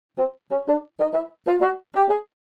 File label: Old vintage cartoon walking